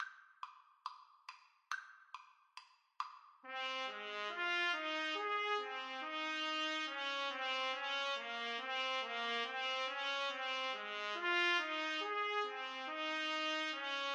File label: goodking, Trumpet Duet